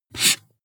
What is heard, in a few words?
marker mark hard short